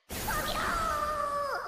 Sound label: cute jump scare